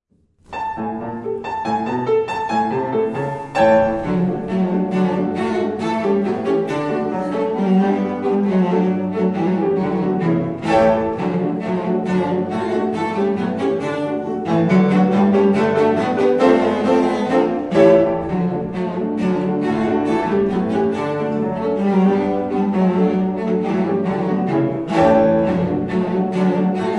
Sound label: Polka